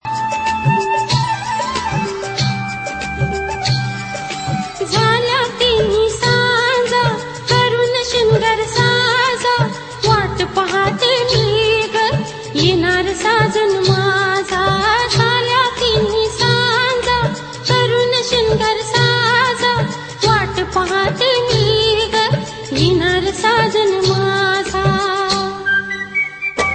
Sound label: marathi ringtone